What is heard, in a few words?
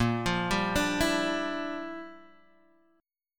G Minor 13th A sharp